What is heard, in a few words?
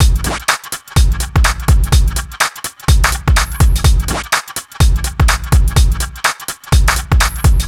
Full Loop 125, Loungin House; Equipped Music